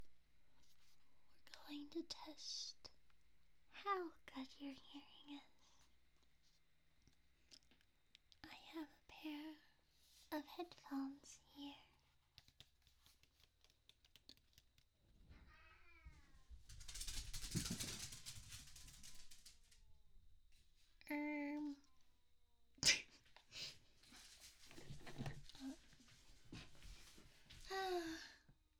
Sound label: blooper cat scream